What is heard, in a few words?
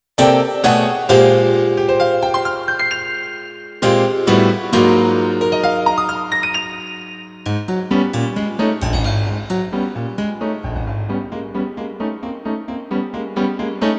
jingle